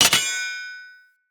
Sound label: Short Sword Medium Parry Impact